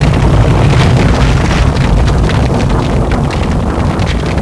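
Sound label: rock slide, ambient rocks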